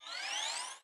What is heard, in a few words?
popup up; ui; chilun; lineageos; vayu 19.1